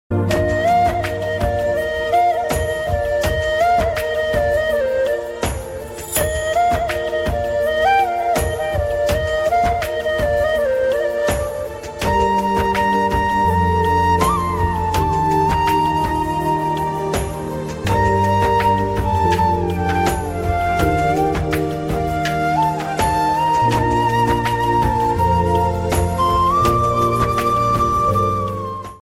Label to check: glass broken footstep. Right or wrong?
Wrong